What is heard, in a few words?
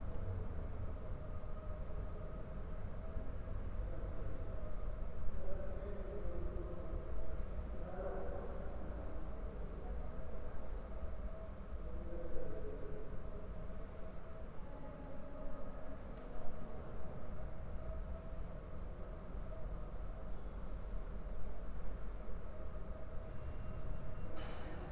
ambience, Railway; Central Line; OpenBVE